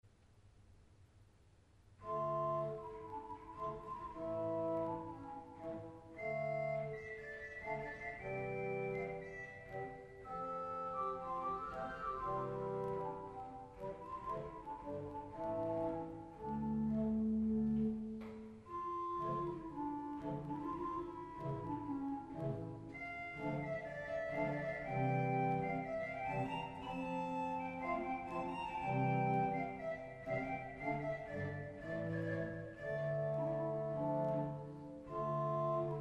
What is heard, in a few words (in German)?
Quintatoene 8